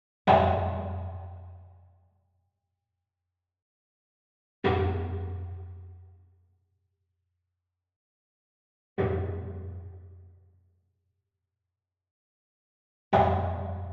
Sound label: Wood Percussion Big Bom Hit Far; boom library; Cinematic Strikes Construction Kit